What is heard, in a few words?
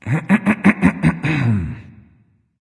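nonverbal clear throat; smite; voicepack; ullr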